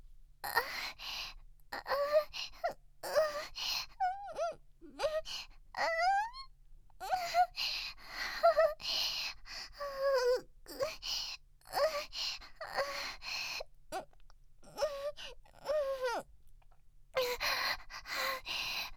慣れていきながら大きくなる喘ぎ

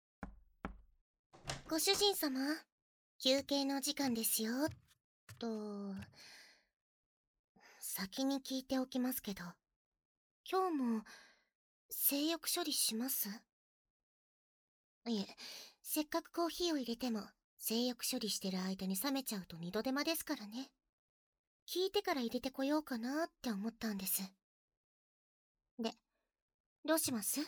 耳舐め手コキ; 『はいはい。耳舐め手コキですね』; メイドさん

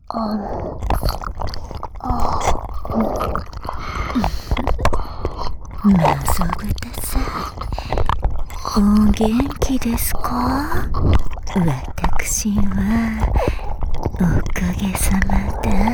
ベロキス騎乗、五重耳舐め; 新たな信者の誕生を祝して、ベロキス騎乗、五重耳舐めです

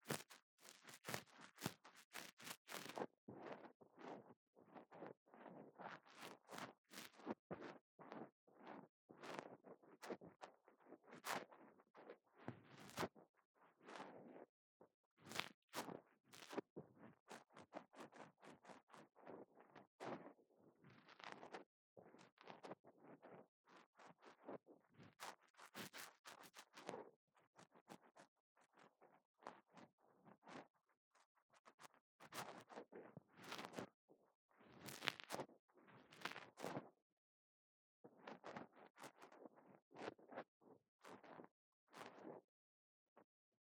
耳かき 右, ASMR

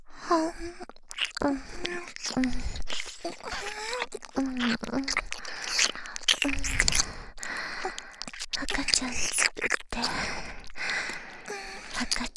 耳舐め; ハーレムオナサポ 4人のメイドがご主人様のオナニーをお手伝いするバイノーラル～文、千曜、名月、莉桜～; 赤ちゃん作ろ♪

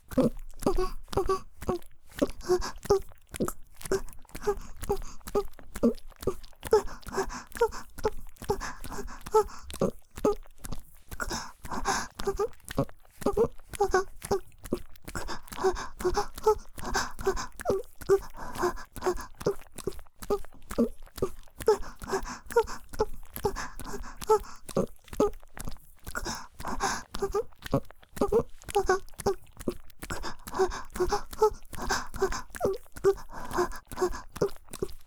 田舎の古宿ン娘の夜伽 宮崎弁 母の喘ぎ声無しバージョン ギシアンループ

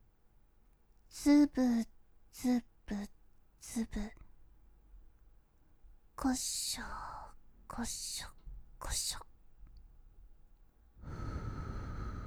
耳ふき; ずぶこしょ耳ふき

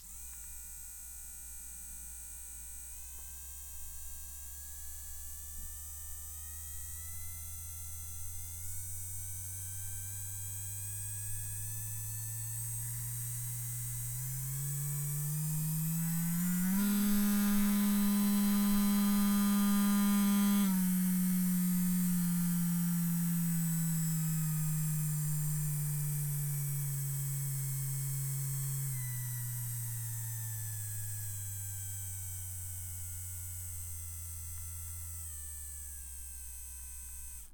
電マ音; すもも色シコシコ麺; 効果音

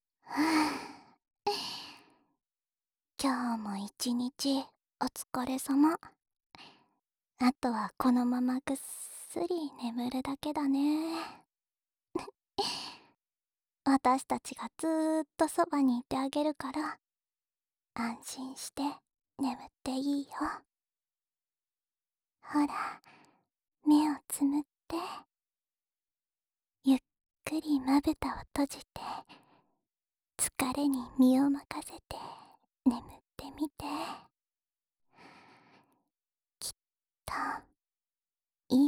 安眠用吐息たっぷり添い寝ループ（両耳)

